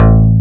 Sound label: DX BASS; E-MU Formula 4000 Series Vol. 2